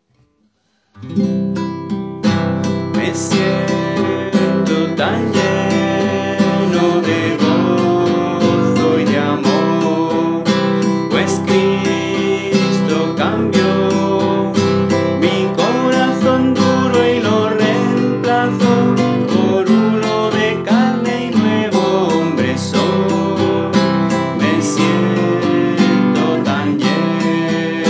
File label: Me siento tan lleno guitarra